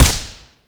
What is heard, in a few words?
silenced shot; Rust